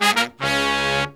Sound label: Pop & Funk Brass